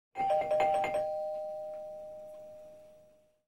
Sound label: doorbell tap